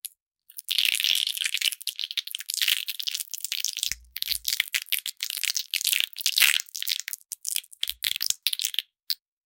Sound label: monster getting caught in, pain